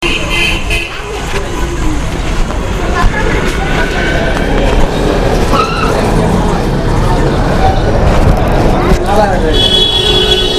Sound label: walk in ts at morn 11am, india; Ted P. Eugenis